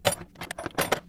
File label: toolbox; snds jack gmod